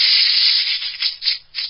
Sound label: rattle, poison crab; svencoop; hl2